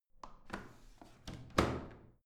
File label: washing machine drawer in; haus garten; haushalt